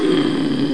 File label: mmmh